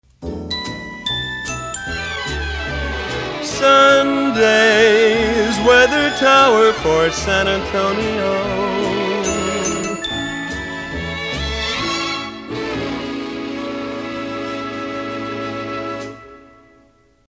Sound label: Weathermation Sunday; KONO; PAMS